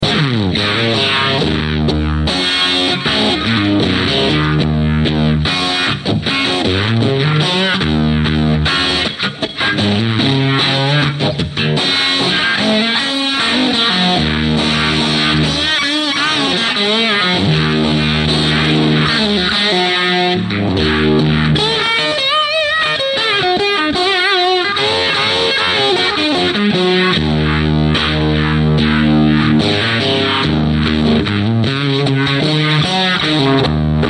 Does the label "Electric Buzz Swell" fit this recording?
No